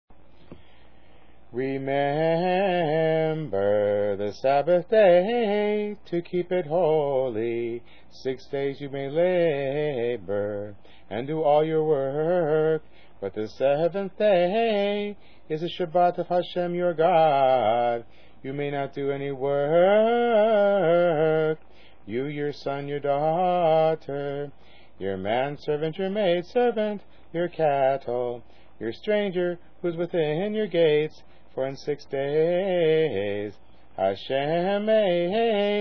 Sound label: Kiddush Shabbat; Commandment